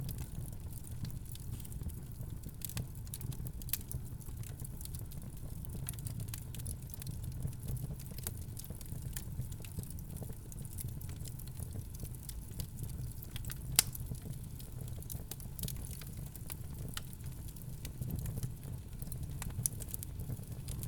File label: Fire In An Open Woodstove